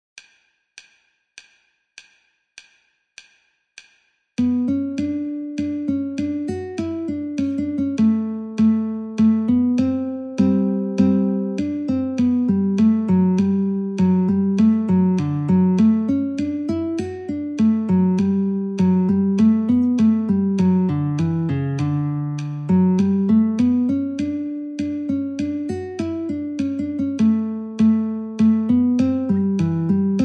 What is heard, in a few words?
Guitar in D metronome 50